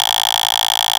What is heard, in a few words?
808 Click